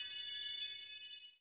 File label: ui quest window